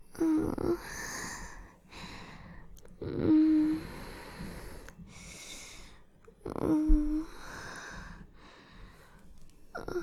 Moaning your name; F4M

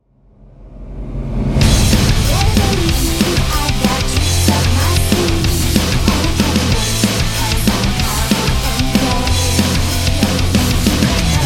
Metal Accompaniment

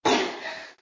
Cough; Lewd SFX

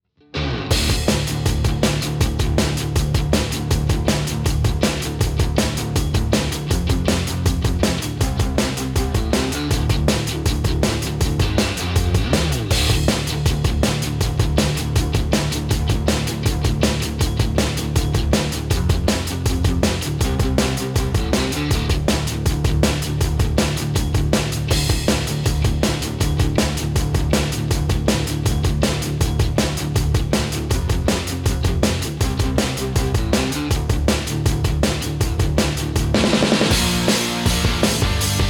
Cutie Rock, Instrumental

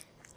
Sex Slide

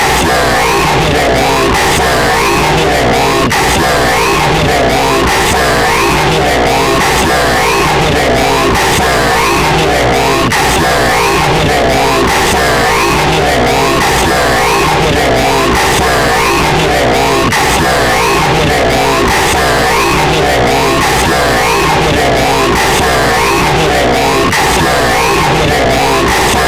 Supersaw Hoover

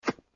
Suck; Lewd SFX

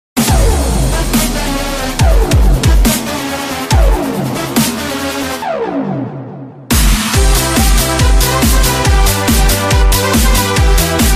super retro rush

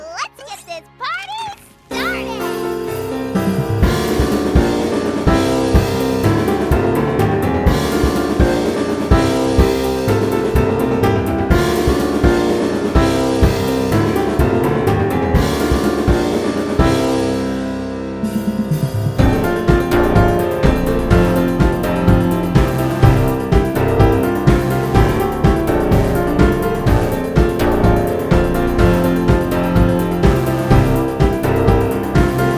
Keyboard Ensemble Remix

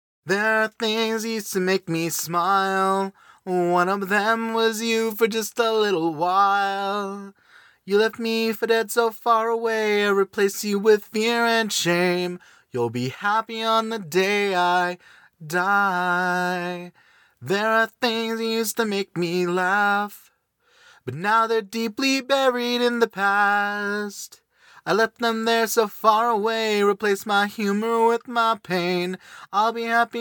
Song Acapella No Instruments

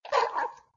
Gag; Lewd SFX

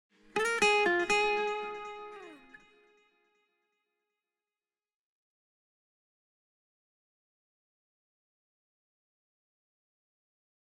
solo guitar, 120BPM

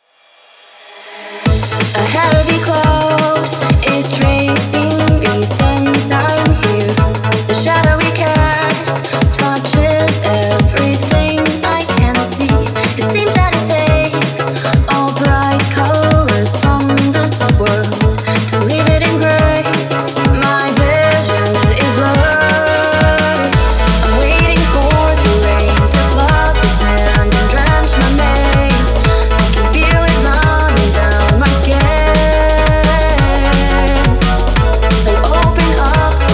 Sonic Breakbeat Remix

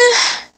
Moan

